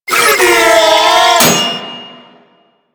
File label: Proto Infested Volatile Runner Explosion Warning; Enemies; Abilities; Warframe